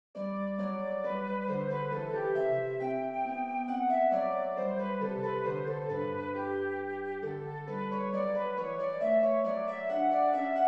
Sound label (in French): boura sol majeur; haendel georg friedrich